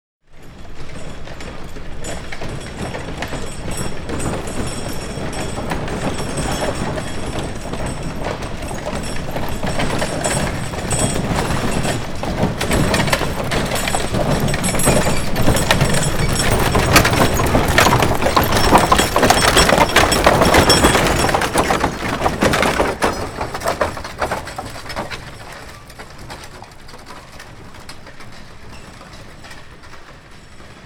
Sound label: Wagons By Slow; Electa Quinney